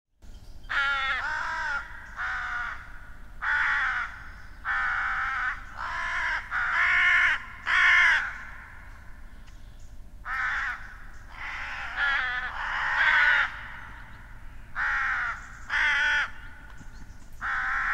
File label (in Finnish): varis